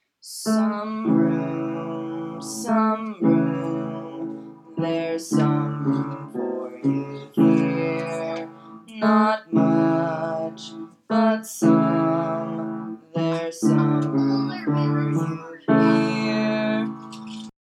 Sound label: innkeepers recitative